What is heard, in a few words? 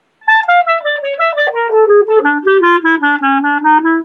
Doina Segment Metered; Klezmer